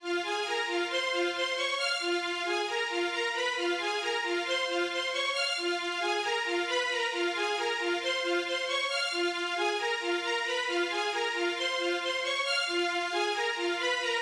Full Strings Finale; Sony; ACID Pro 7.0

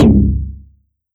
DMX Perc; FX Hit; Drums; Ableton Live 12 Lite; Core Library

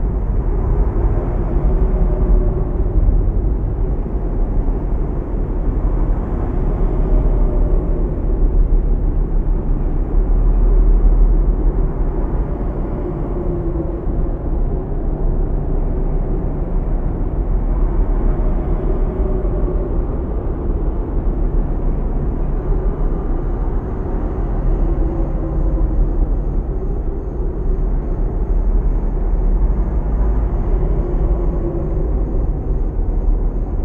Canyon End Ambience Quad; Journey

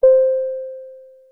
ding beep; To the Moon

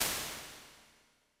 Plates Digital Small Plate L; Impulse Responses; Ableton Live 12 Lite

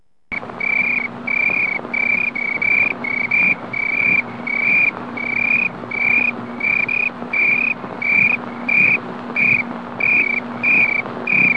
cricket; Digital Paintball 2

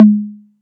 Tom Dry, Drums

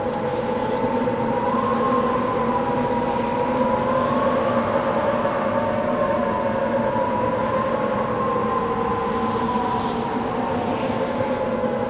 Mountain Window Wind Tunnel Sm Loop Stereo; Journey

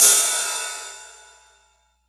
Ride Full; Drums

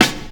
Snare, drums

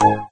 message sent success; There; ThereClient

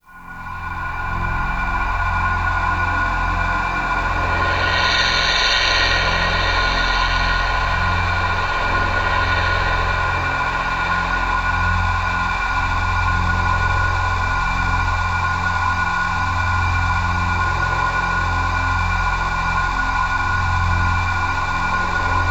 Hi Voweler f3, Synths; Multisamples; Ableton Live 12 Lite; Core Library

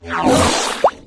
drink coffee; There; ThereClient

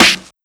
Snare Vinyl Slap, drums

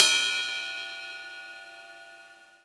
Ride; Drums; Ableton Live 12 Lite Core Library

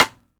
Rim

